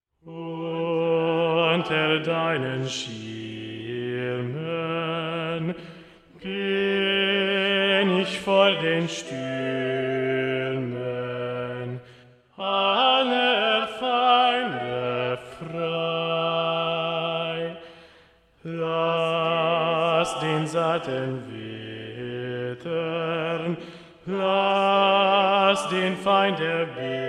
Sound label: Jesu meine Freude Movement 1 Bass Predominant; Movement 3; Bach